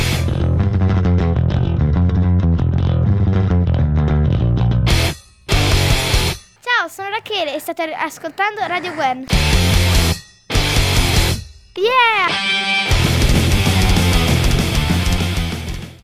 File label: Jingle